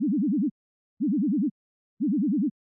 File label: warble 250